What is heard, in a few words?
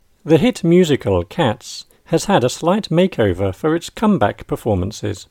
rap song